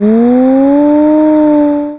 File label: wolf